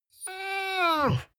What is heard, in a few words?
dog whine low complain; natur-tiere; hunde